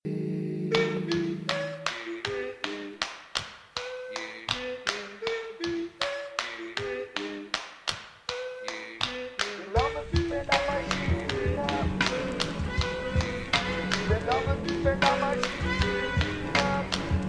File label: canto